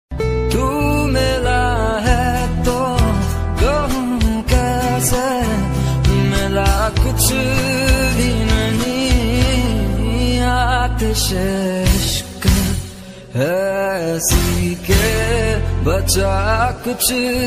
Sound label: pakistani ringtone